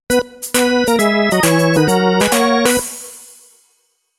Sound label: jingle